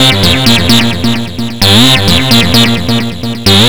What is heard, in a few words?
NRG Lead, trance